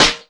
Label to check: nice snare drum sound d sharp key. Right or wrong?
Right